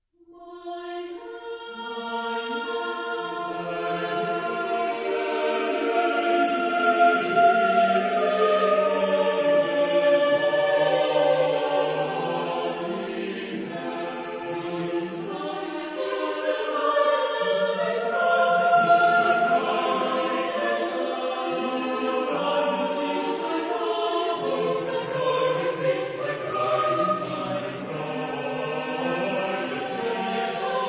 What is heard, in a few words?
Motets, chorus; Mendelssohn; 3 Motets Op69; Mein Herz erhebet Gott